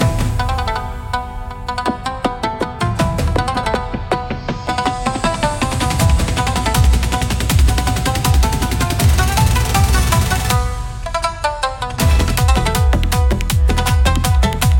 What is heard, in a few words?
Thunder on the Pier, cinematic japanese style battles